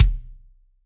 Kick Hard, Drums

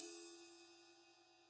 Ride; apulSoft apTrigga2; Multi-Velocity Cymbal Samples

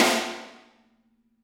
Snare Crack

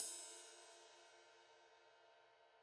Ride, Multi Velocity Cymbal Samples; ZKC 20; apulSoft apTrigga2

